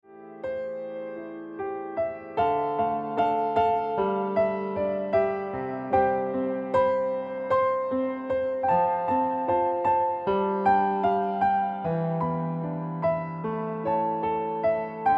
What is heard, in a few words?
Ringtone Piano Music